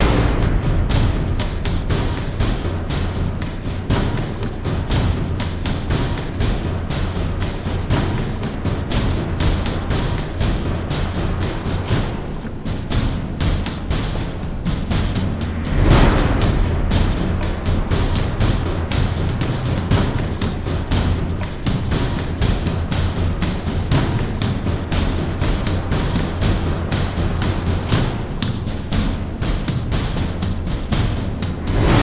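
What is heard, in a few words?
music, end percussion only loop; transformers war for cybertron gamerip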